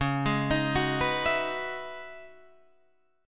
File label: Db Minor 11th, Guitar, Drop D